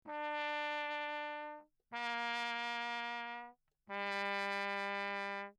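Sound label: trumpet mute low; Perinétventiltrompete; Lechner; JoRal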